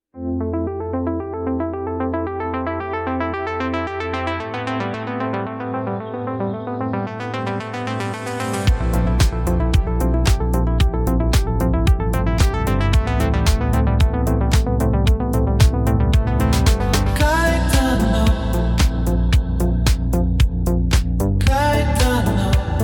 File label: electronica